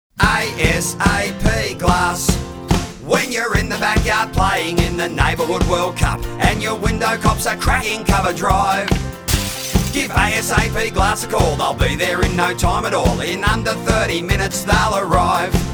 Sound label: Glass jingle; ASAP